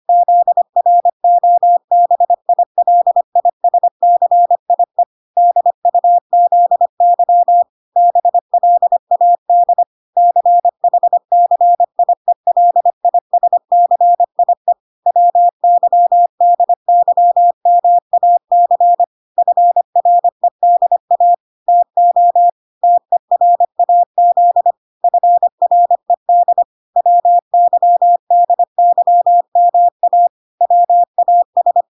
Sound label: 25wpm